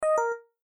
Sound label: Windows Vista alart